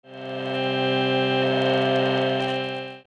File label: drone C; Synthasis